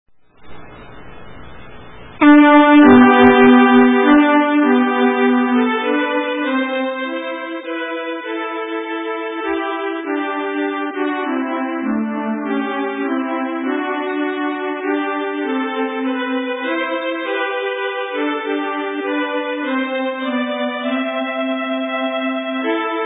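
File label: Irsk vals, Irish waltz; Fanny Power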